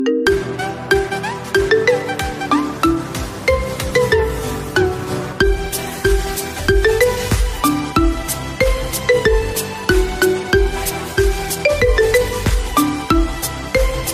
dj funny ringtone; funny ringtones

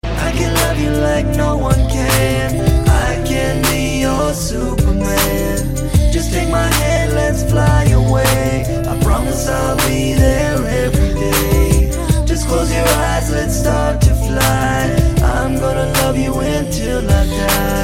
english ringtone

